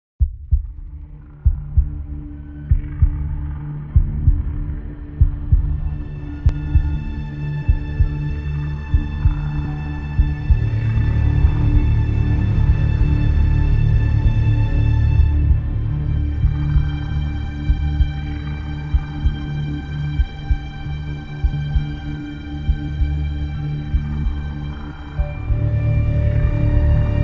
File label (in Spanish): MUSICA de TENSION para tu Streaming